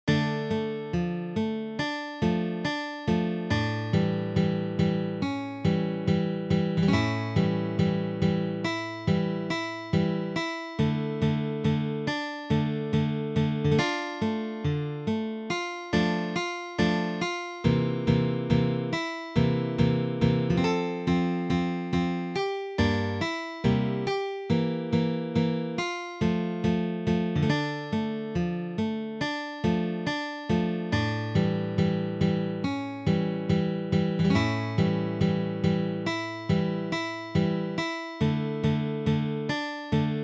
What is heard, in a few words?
imitation - D minor key; carcassi